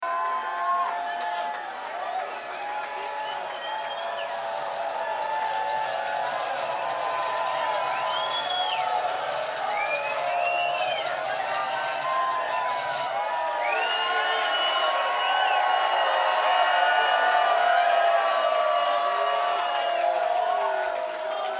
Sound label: Crowd